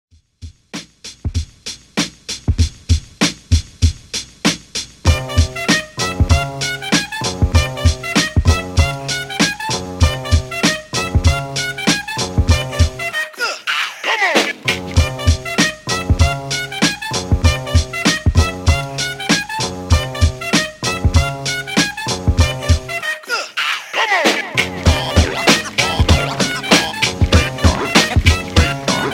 Old School Redrum 97 Bpm

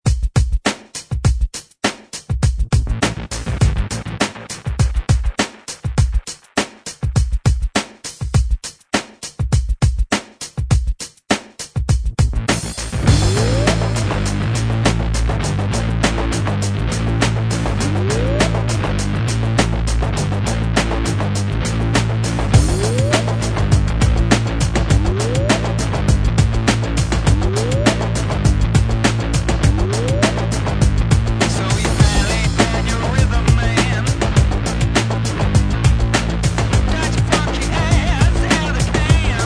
Rock

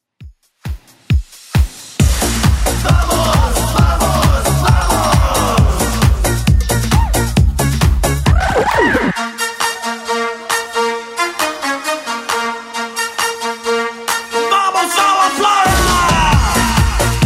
90s Redrum 134bpm

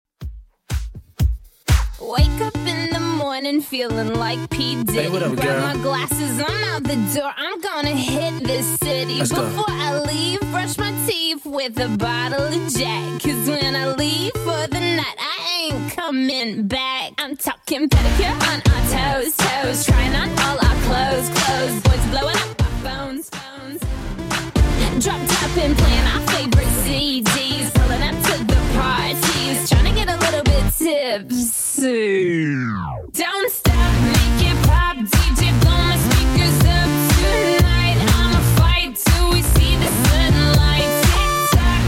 122bpm

